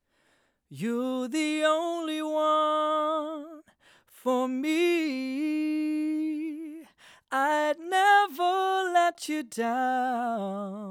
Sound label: Vocals Light Editing; vocal aufnahmen bearbeiten und mischen